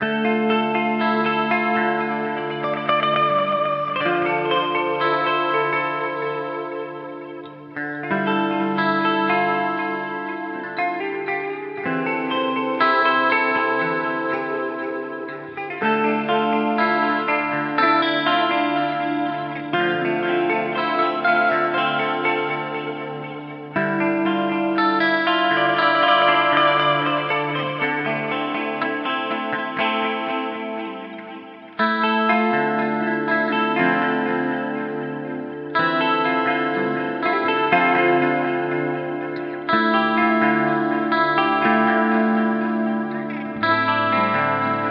Harley Benton DNAfx GiT Clean Flanger

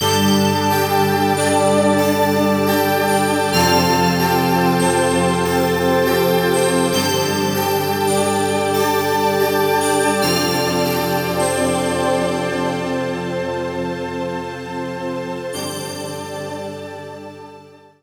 D-50; Bright Day

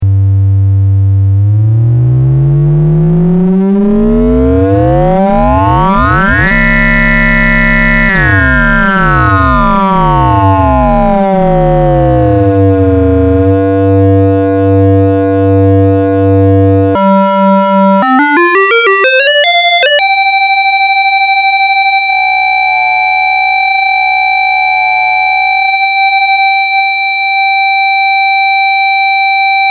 FM Carrier Tri Modulator Rect

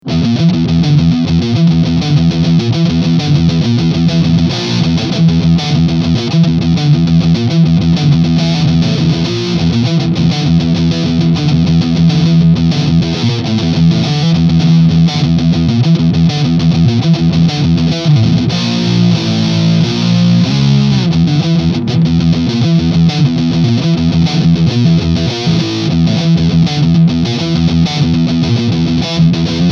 Nux Mighty Air DIEZEL VH4

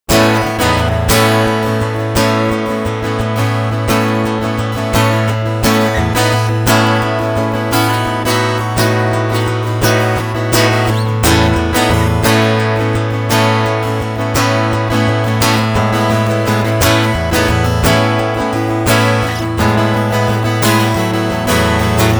Strumming Full EQ

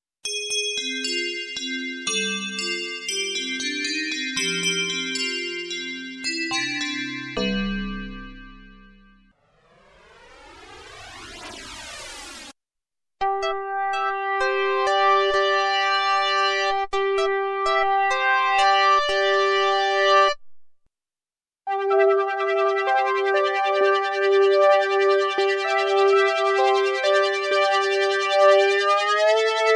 Nord Stage 2 Synth

